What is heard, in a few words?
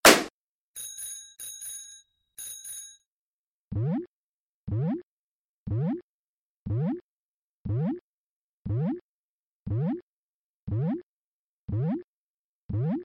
muddy sounds; peppa pig puzzle